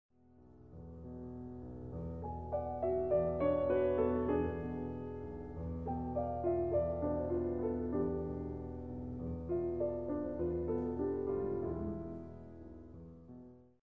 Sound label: berceuse, piano 4 mains